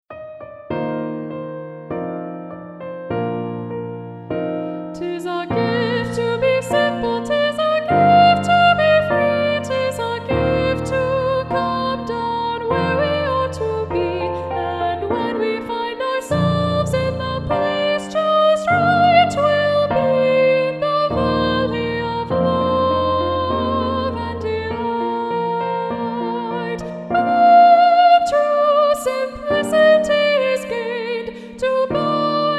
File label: all sopranos, simple gifts, Bb major